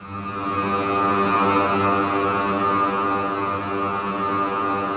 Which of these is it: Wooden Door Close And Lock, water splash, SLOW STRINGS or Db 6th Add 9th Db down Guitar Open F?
SLOW STRINGS